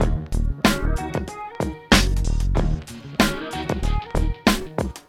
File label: Hiphop, ringtones; Google; NewWave Labs